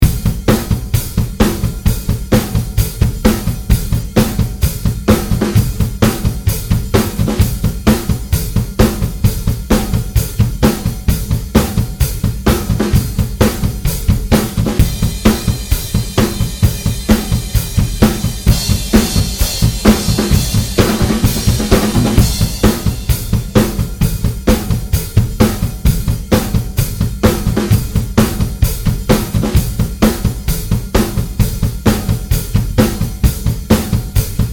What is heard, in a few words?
Rock Baby Drums